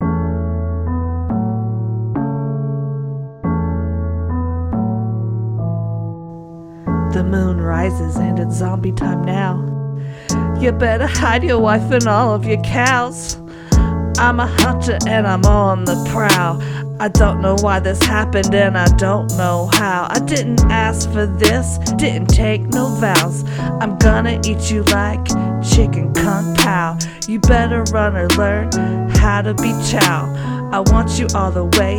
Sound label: rap; minecraft